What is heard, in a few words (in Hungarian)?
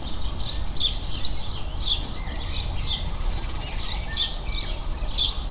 csipognak, hazi vereb; debreceni zoo 2012